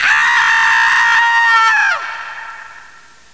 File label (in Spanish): grito